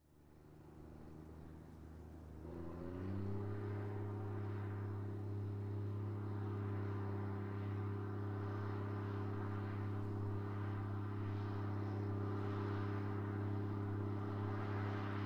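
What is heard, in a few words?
Case Puma Exterior By Steady RPMs High Distant; Case Puma CVX 2001; Pole Position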